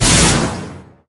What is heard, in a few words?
Inferno Shoot